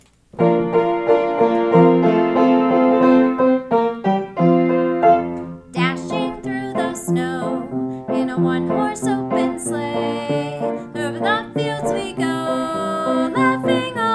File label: jingle bells